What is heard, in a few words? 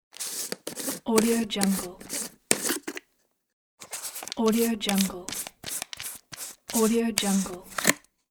unscrewing jar lid